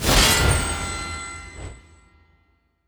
wild Hit; netent

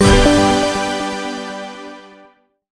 Win Mid Start; netent

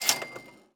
cash register down; netent

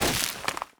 reel Bounce; netent

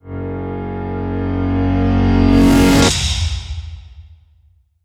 winline big Win; netent

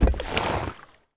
animal Hover; netent

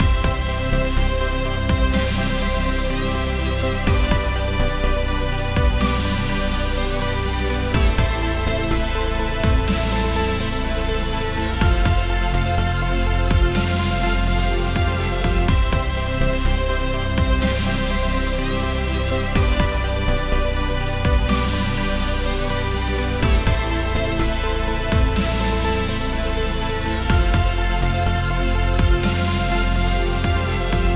sparks big win; netent

